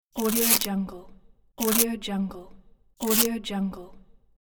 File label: adhesive tape